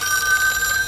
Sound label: telefon fx; MED; Jester